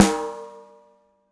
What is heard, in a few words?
snare, gretsch kit